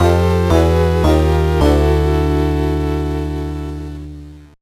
Memory Game Failed; maya the bee the great adventure gba gamerip 2002; Flip's Memory Game Failed

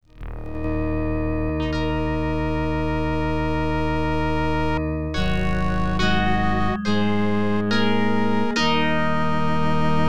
Splash; tetris classic

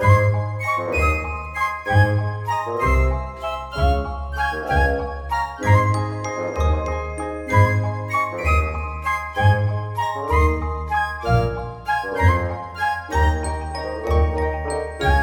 Frog Hop; Toy Story Mania

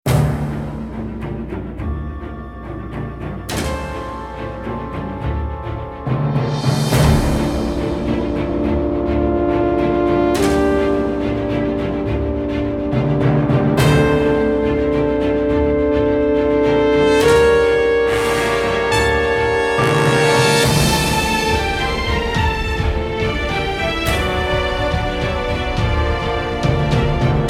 Bossfight Loop

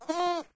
Kid Boy

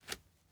masonry jump; teardown; ps5 windows xbox series xs

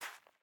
Footstep Sand; Main Character

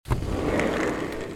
Slide Door Open; gamerip

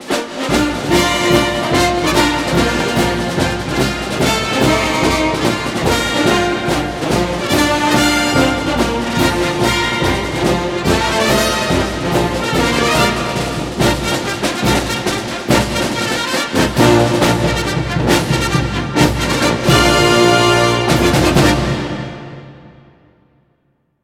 Broadcast Fight Song

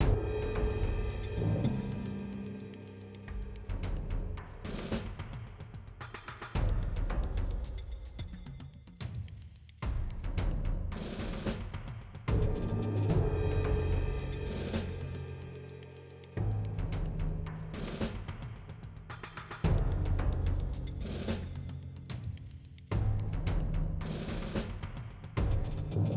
Garden 1 Perc; Forced Showdown

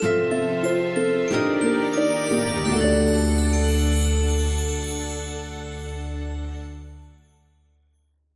music jingle tally screen

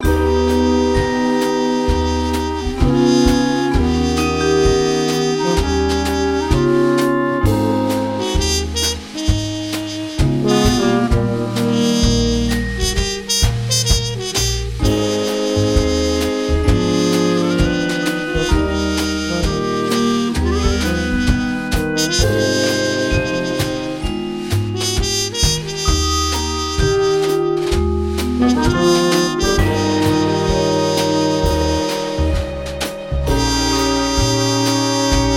Swamp Harvest; Frog Music Streamed

